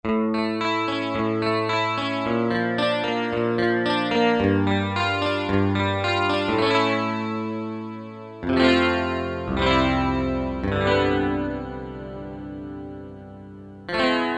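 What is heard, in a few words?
guitar echo Strat; E-mu Proteus FX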